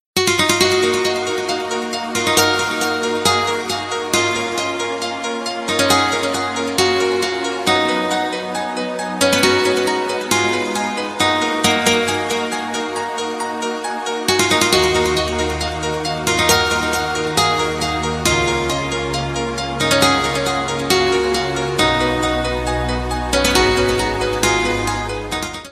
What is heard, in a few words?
happy guitar ringtone